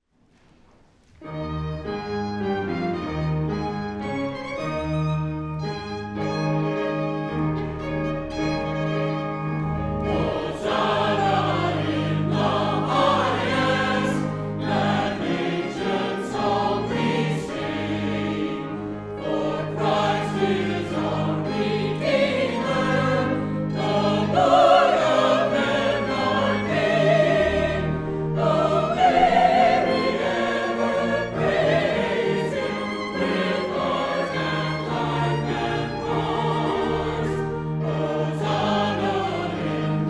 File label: Fanfare; Palm Sunday